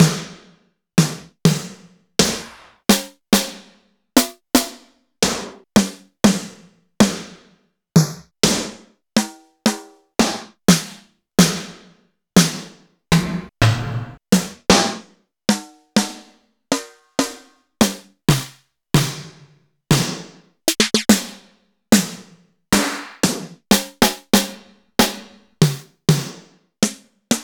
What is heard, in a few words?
Alesis D4 Snr; Sliced